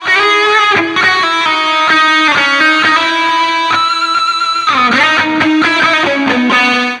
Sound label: guitar solo; Guitarra